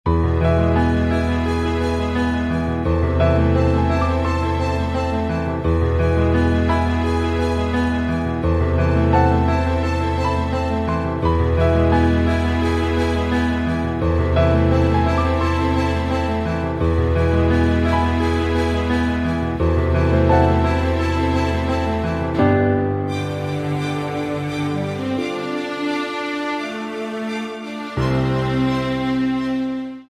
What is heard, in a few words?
No Vocals; Princess Peach Showtime!